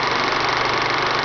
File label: dia projector